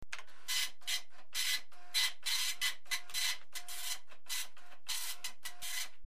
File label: printer; DATOR; SKRIVARE